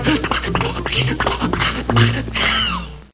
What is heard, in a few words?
out of gas; RS Games Sounds